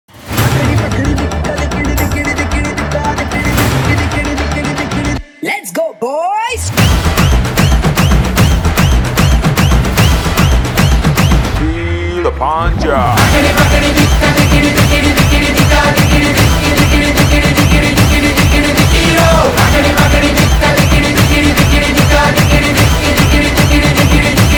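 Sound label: background music Ringtone; Liger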